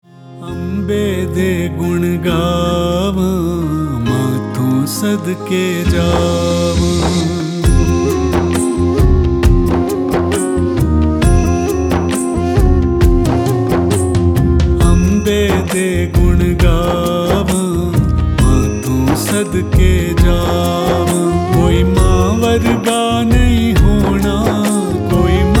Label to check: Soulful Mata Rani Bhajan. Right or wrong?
Right